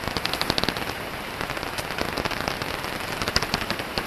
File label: Natterers bat; Jules Agate